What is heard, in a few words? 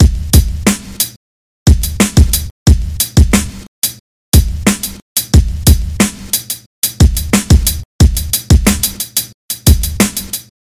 Heavy beat with hat gallop; RRUU